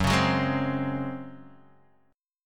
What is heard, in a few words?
C sharp Major 7th Suspended 4th F sharp